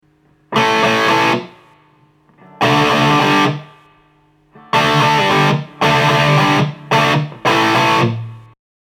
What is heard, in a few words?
riff sample, guitar